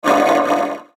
Sweeper Filtered Pan; Production Element Imaging Element Accent Transition; Sweeper Sound Effects

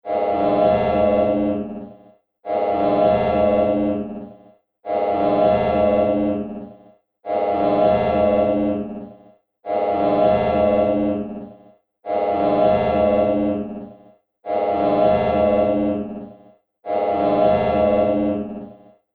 Scifi Laser Throb Sound, looping

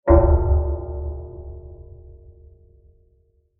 Large Deep Dark Metal Impact; Blasts; Impacts

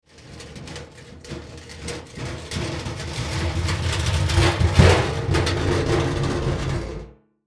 Garbage Can Drag, Garbage Can Drag On Cement; Household; Foley; Blastwave

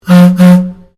Ships Horn; Boat; Sounds onboard HMAS Castlemaine; Vehicles

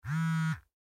Cell Vibrate, Cell Telephone Vibrate Short; Cell Phones; Electronics; Technology; Blastwave

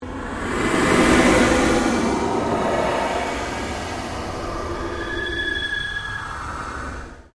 PULSE LONG SWEEP

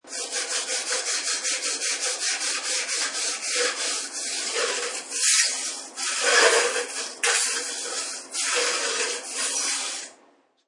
Cleaning a burner with a metal brush; Scraping A Burner; Household; SFXsource

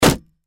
Impact Axe Hit Wood Wall Door Chop; Axes (Sound Effects:Tools); Blastwave

